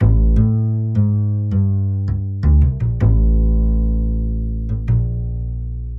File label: Doppel Bass, 80bpm, A; Loops n Lines